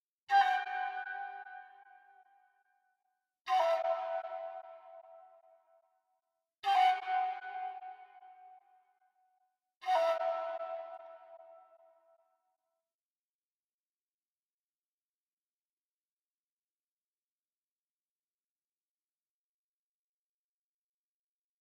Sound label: 151 BPM B Min Flute, Trap; Cymatics; 2022 Melody Collection; G Wagon